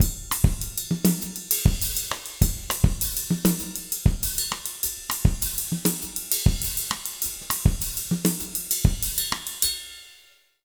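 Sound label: 100 Mozambique, jazz latin drumloops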